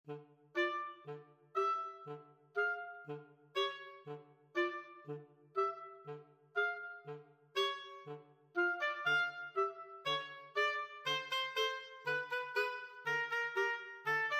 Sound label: tchaikovksy sugar plum, clarinet oboe duet